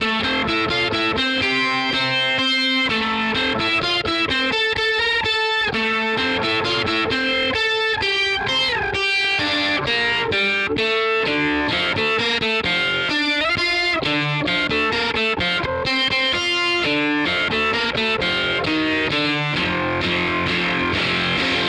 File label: Source Audio Ultrawave SA 250